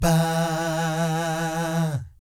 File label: VIB FX, Vocal Planet Jazz & FX; ILIO; JAZZCOMBO